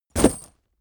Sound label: leather metal bodydrop